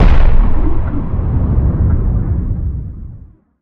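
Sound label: sounds of meteorite asteroid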